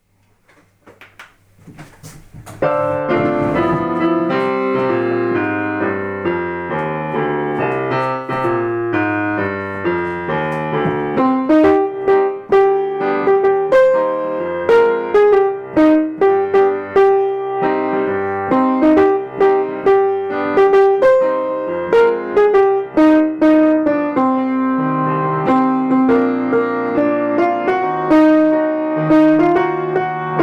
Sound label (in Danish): tenor bas + akkompagnement